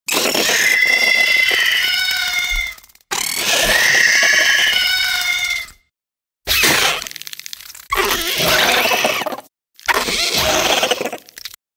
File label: Insect Screech Monster Sound effects